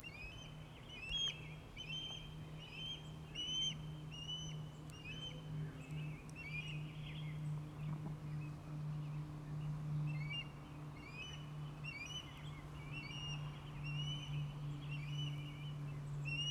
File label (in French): Balbuzard pecheur; Robert Goff